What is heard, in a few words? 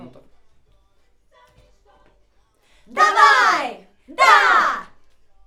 Schoeps AKG, piano